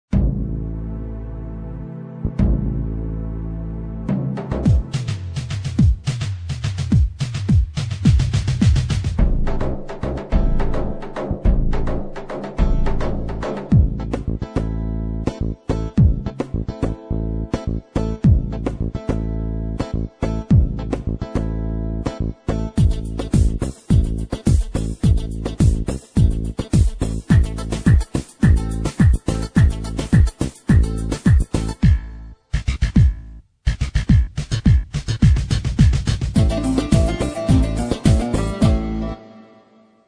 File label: halay, turkish; pa80; korg